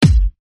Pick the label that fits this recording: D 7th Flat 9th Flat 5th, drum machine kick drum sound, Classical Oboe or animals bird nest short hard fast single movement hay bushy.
drum machine kick drum sound